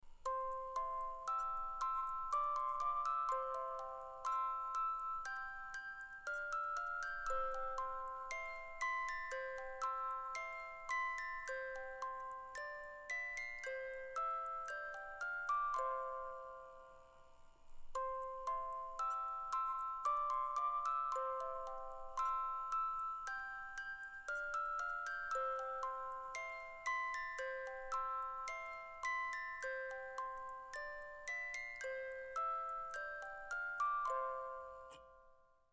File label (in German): Ward ein Blümlein geschenket, music box two girls with 18 note musical movement; Wendt & Kühn